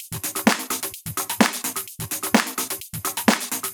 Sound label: Minimal Loops